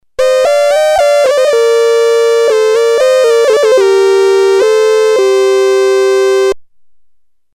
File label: polivoks folk lead